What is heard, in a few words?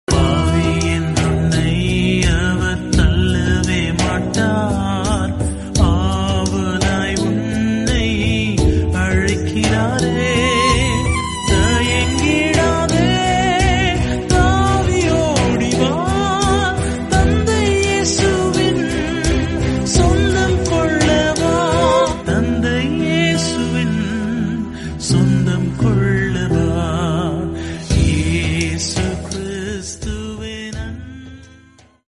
Tamil Christian Song